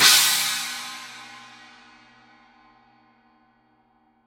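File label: signature 16 thin china edge, cymbales; Forgées à partir d’un alliage secret de bronze; paiste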